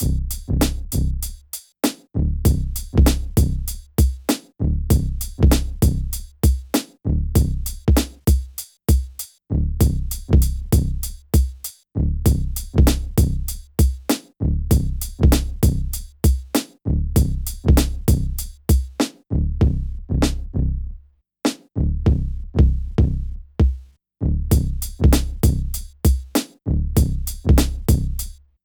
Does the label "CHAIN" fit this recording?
No